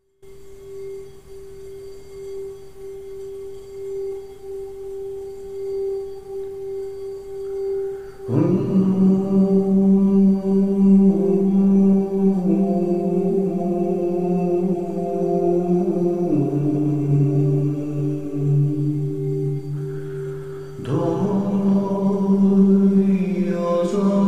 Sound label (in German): Klangschalen Gesang